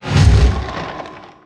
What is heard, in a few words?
splinter blast target; winter wyvern